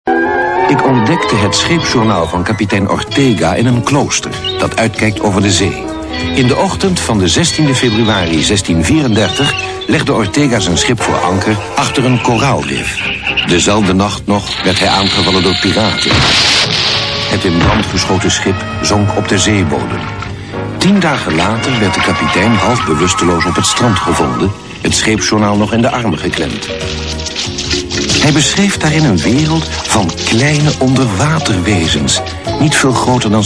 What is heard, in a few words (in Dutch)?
snorkels